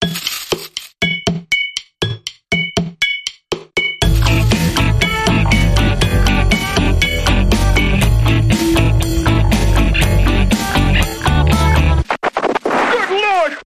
Good Lord Reggae Bumper Reversed

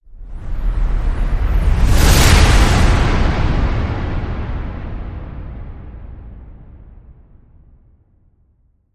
Feel The Whoosh

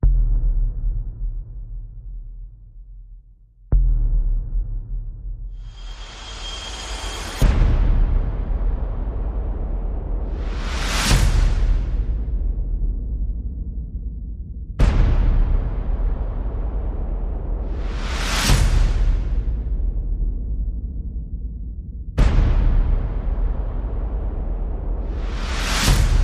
Drums, Percussion and FX

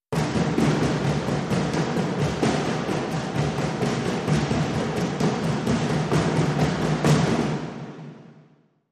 Loop Layer Dhol Brush

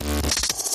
Droid Cyborg Talk

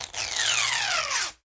Ribbon Curl

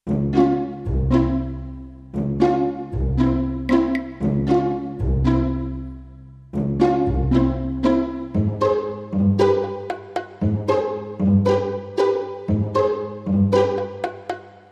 Modern Percussion Full

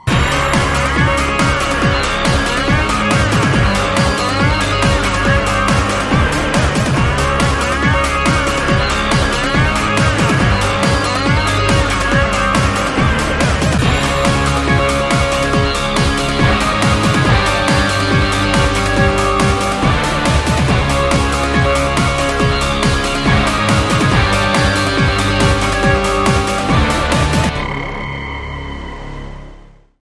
Looping

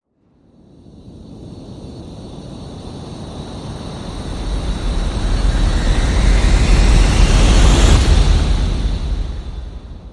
Santa Spirit Sleigh Rise

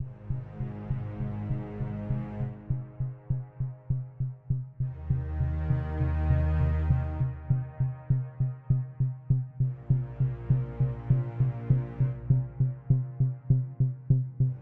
Drums Bass and Low Strings Only

